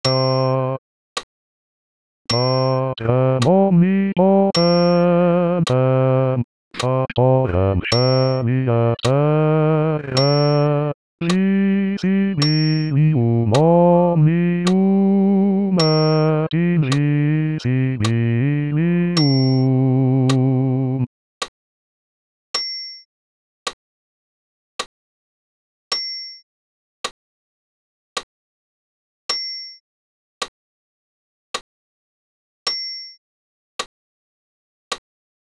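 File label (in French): Credo basse voix seul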